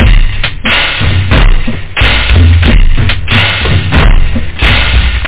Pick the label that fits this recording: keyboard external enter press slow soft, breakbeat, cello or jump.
breakbeat